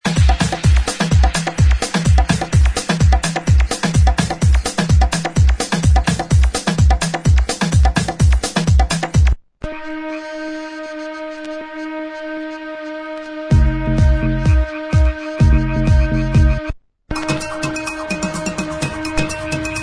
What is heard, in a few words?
Tanque Beats